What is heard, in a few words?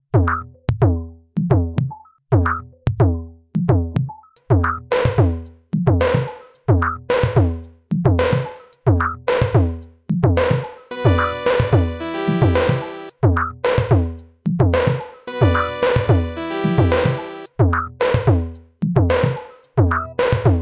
Ambient Electronica